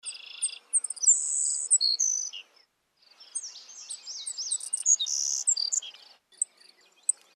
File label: SPARROW SAVANNAH, birds; species/birds; wbba